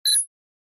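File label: Flash Beep Electronic Double Tap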